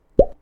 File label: Pop